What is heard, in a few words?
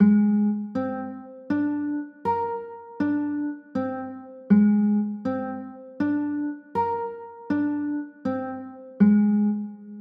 b flat 9, chords